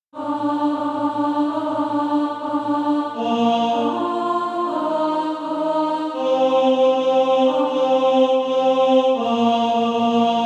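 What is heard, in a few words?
alto, Practise Music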